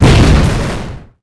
Small Exp, Explosions

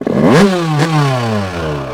kick, YAMAHA

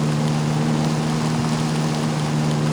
car driv; Matt Hoffman's Pro BMX 2; XBOX

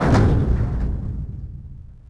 spaceship Touchdown

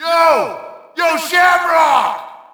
Shout, audience; UFC Tapout 2

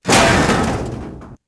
crate bust, explosions; weapons explosions

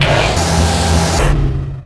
turbo comp; Quantum Redshift; XBOX; kumiko

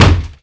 Target Hit

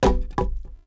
club hit concrete; objects; Star Wars Obi-Wan; XBOX

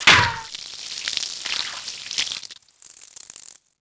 watertank hit, bullet hit; projectiles; Max Payne

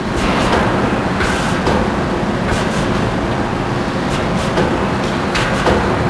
ambience steelmill; cold steel

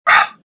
gasp; Star Wars Jedi Knight II Jedi Outcast; XBOX

